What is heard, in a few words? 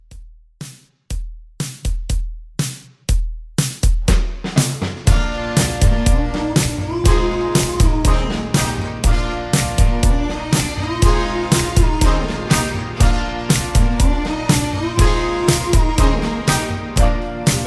60s Pop Re Drum 121 CLEAN